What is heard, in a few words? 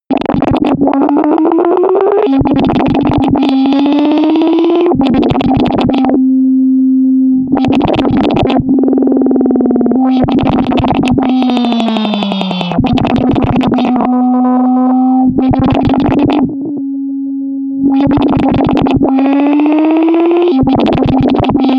atmosphere glitchy squeaks